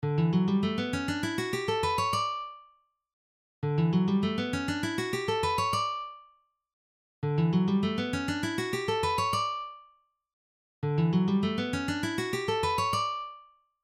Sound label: Scales in D; Scales and Arpeggios